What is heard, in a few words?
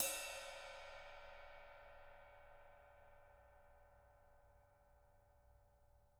rides